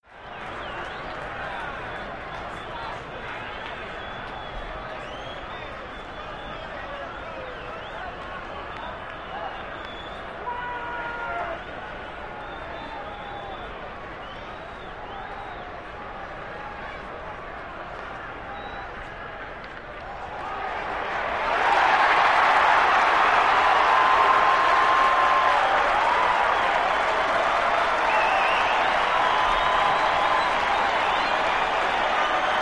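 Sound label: Arena Crowd Yell Cheer; sports arena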